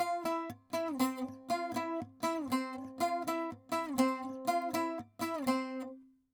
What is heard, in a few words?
saz